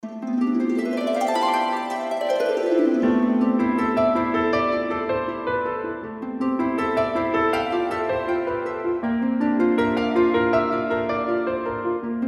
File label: music titlecard sokoban